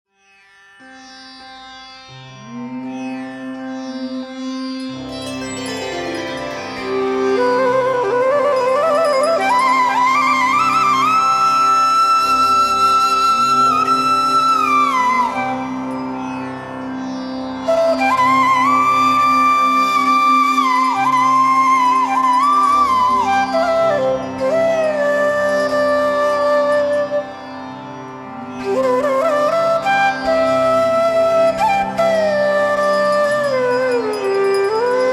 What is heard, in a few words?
Flute Intro; brahman naman 2016